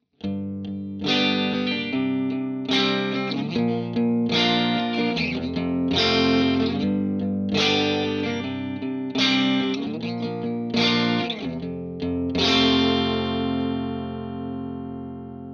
strat vol full jtm45; vovox sonorus protect a350 ts-ts test

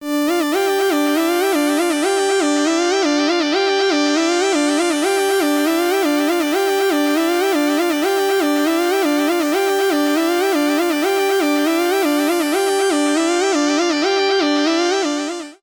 korg minilogue lead

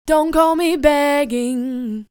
120 dontcallme Bm

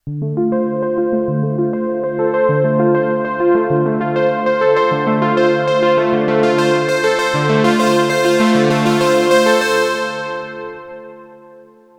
Novation Peak test arpeggio

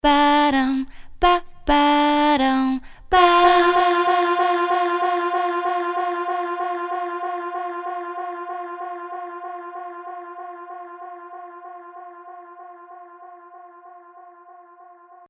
dub delay; psp echo

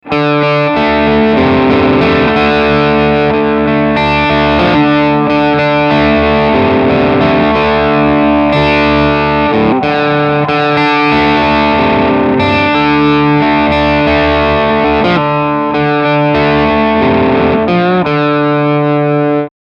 epiphone sheraton ii bridge overdrive

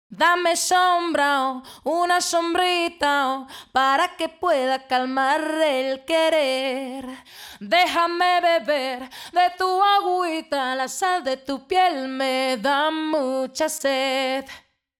distressor vocals distressor opto; uad empirical labs distressor test

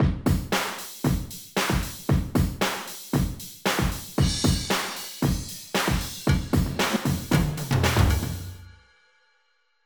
punch bigbeat; rob papen

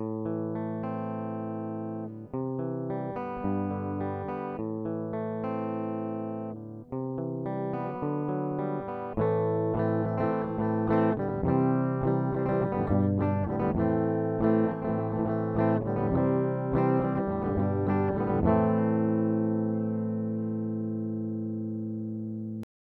ik multimedia axe io clean guitar; AXE I/O Solo; ztonebold; IK Multimedia AXE I/O Solo Test

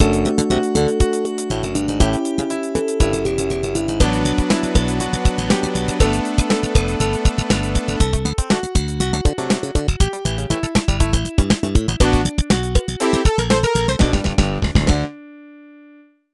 funk; forte 10 premium; bandora

